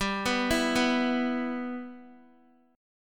G 6th G, Guitar; arpeggiated; x,10,11,9,11,x; 4F,4A,5C,5G,5C,6E

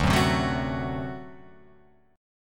D 7th, down, Guitar, Open F; D7 strummed; JGuitar

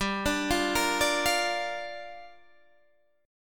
G Minor 7th, Guitar, DADGAD

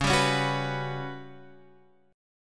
G Major 7th Flat 5th

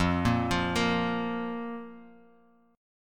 Bb 5th F

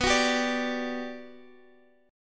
G Major 7th, B

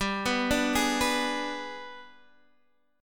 Ab Minor Major 7th Flat 5th, G

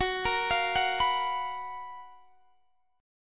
Gb Major 11th, down, Guitar, Drop D

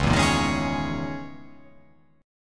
C sharp Minor 6th Add 9th C sharp

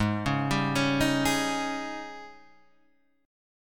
Ab Major 7th Suspended 4th Ab Guitar Drop D; 6,4,5,6,4,4; down

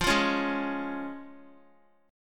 F Minor Sharp 5th F down Guitar; Fm#5 strummed; JGuitar

